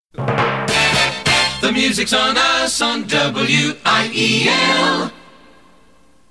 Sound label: jingle